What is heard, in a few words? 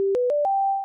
victory